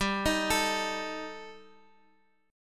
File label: G Suspended 2nd Sharp 5th, Guitar Open F; Gsus2#5; arpeggiated; x,14,15,16,x,x